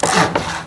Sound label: build